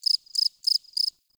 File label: Forest Open Glade Cricket Close, Day; Ambience